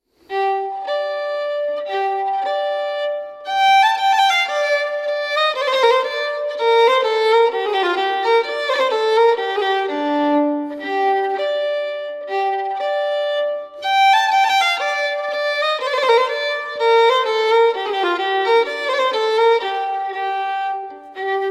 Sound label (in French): Polska Du Coucou, Musique de Suède